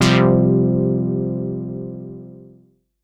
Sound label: SYNTH Filtered